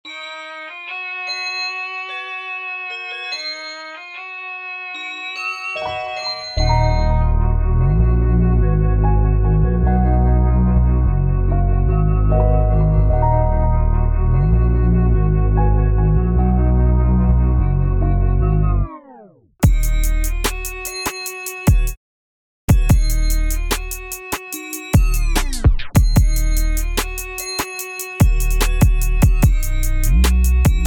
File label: Instrumental